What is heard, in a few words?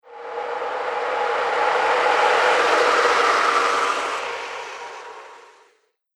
Air Wave Whoosh